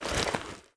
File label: draw, weapons; brenmk3